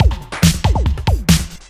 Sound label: Breakbeat; Vengeance Samples; VEC2 Loops